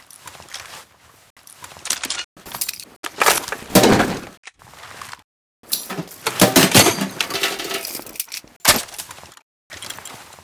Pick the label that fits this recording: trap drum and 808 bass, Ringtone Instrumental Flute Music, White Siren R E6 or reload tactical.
reload tactical